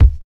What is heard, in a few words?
warm sounding bass drum sound b key